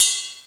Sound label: SY35, Yamaha Sy35, Drums